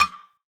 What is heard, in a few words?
Percussion, Hip Hop; Drums - One Shots; Cymatics - 9God Hip Hop Sample Pack